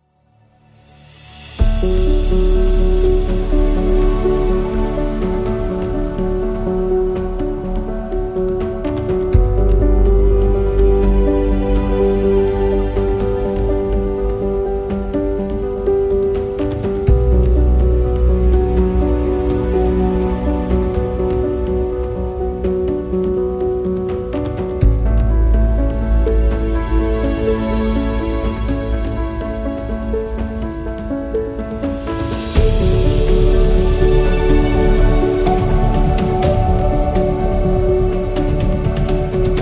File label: Meditation; healing meditation